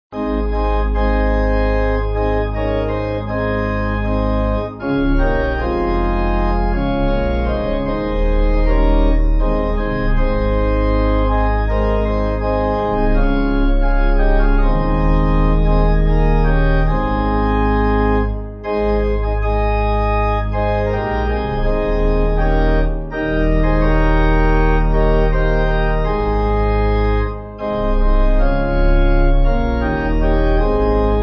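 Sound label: Pipe, pipe organ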